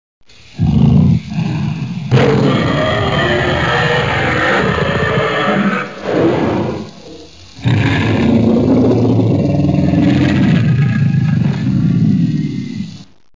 dinosaur roar or scream

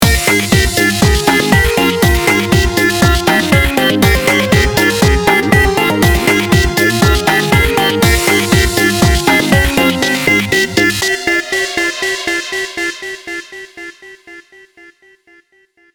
game music

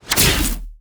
lightning whip

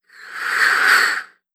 cartoon air blow

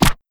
fast blow

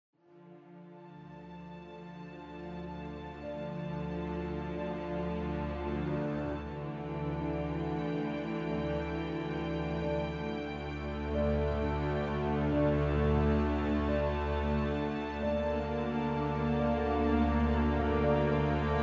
sad piano ambience

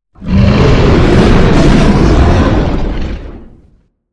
monster

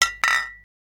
glasses clinking

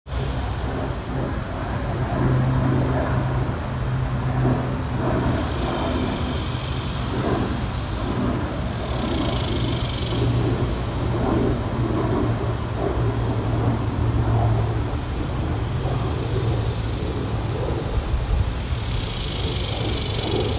cicada background

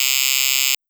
electric fence

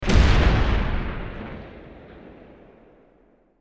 bomb explosion

